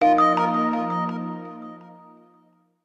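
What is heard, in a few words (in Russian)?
уведомление о пришедшем сообщении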